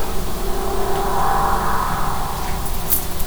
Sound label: sound in basement amplified; burlington